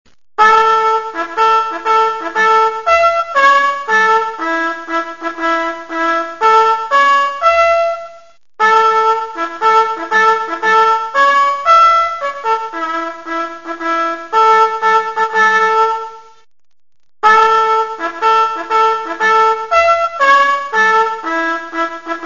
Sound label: Bugle Call Tattoo; armi